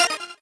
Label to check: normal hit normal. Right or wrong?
Right